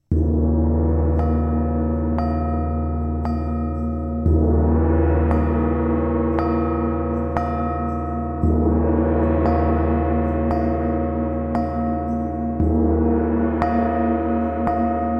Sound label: Gong